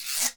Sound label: guiro, Synclavier Percussion, World & Orchestral